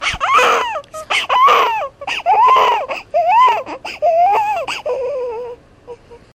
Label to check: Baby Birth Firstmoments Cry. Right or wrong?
Right